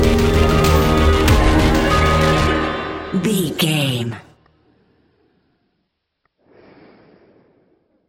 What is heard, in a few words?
modern horror stinger